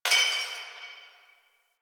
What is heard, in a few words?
Distant Glass Breaking; Warframe; Ambience; NineteenNinetyNine